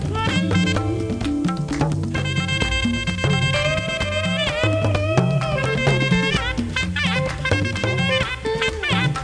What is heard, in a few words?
rhyth; endorfun; rloops